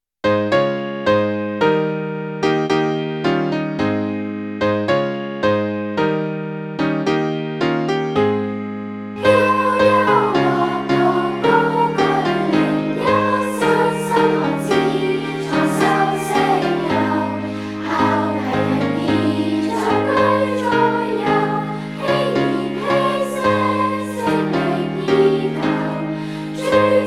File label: schoolsong vocal